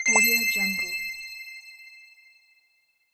sparkling pop bonus

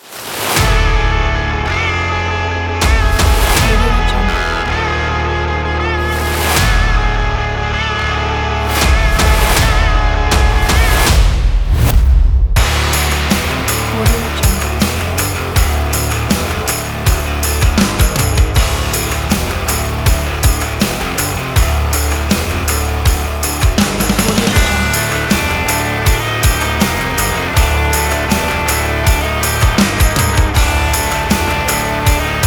dark rock trailer; audiozen

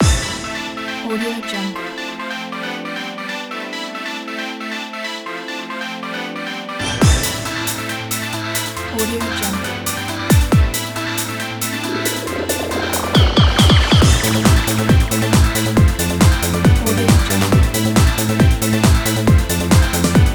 90s

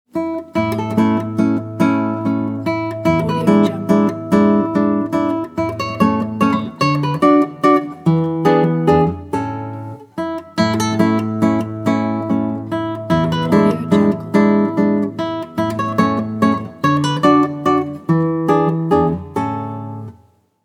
christmas positive guitar logo

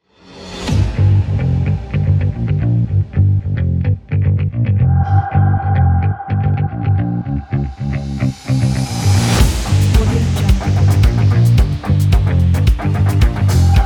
action sport; bigstyle

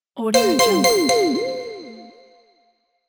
new message

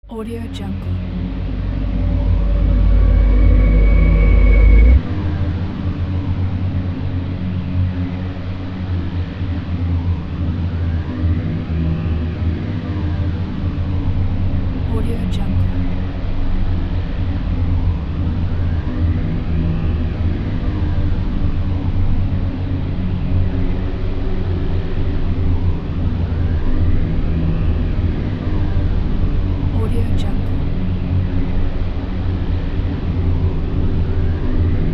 scifi abstract background

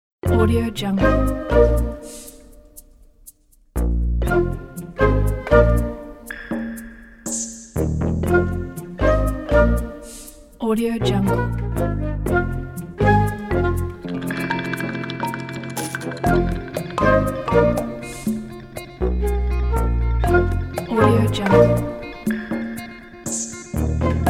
cartoon and quirky comedy

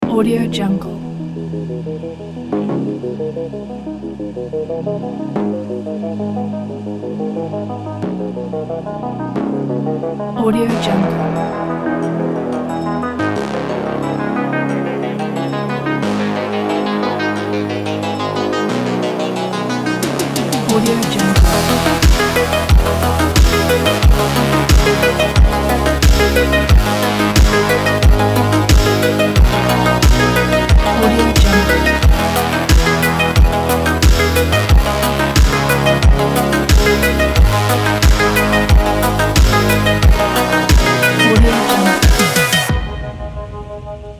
epic synthwave inspired sport

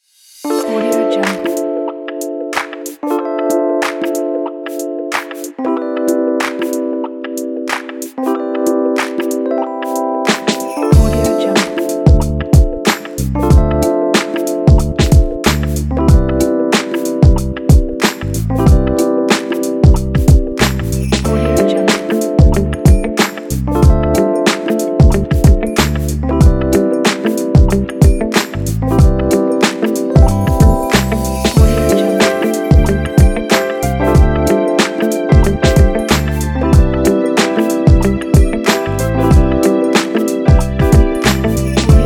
soul

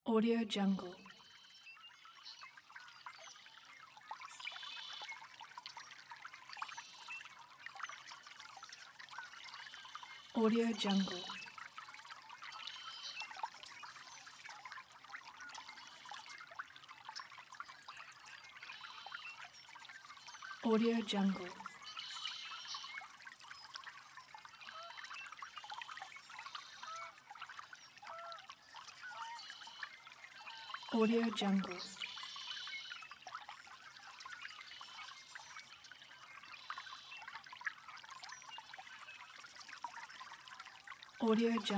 day is a nature

